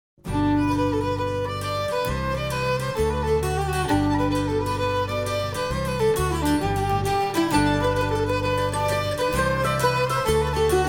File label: Jigs